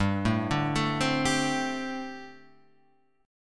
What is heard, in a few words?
G Suspended 2nd Suspended 4th, chord; Gsus2sus4 arpeggiated; JGuitar